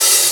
OP Hihat; Cymbals; Open Hihats; VES1 Open Hihats

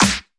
Pre-Shifted Claps; Vengeance Samples

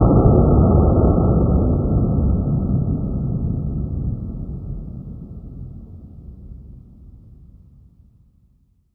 Hits & Explode

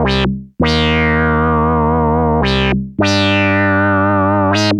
ARP Odyssey, Arp A Line, synth samples

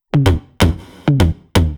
Electro Loop 128BPM; Vengeance Samples

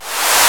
Short Fills & Reverse; Vengeance Samples

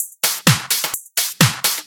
House loops 128BPM; Vengeance Samples

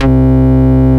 Ultimate Bass Root C; Vengeance Samples

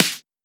Snare; Vengeance Samples

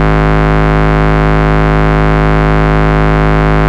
Bass, Root G#; Vengeance Samples

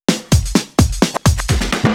Fill 128 BPM; Vengeance Samples; VEH1 Loops

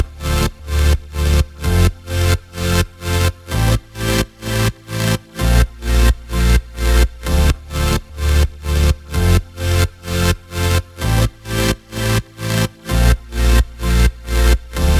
128BPM Melody; Vengeance Samples; VFH1 128BPM The Classic Kit

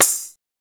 Tamb; Tambourine; Cymbals; Vengeance Samples

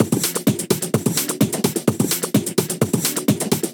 House Loop, 128 BPM; Vengeance Samples

